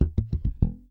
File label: NOTES, Bass; Sampleheads; Will Lee Bass Library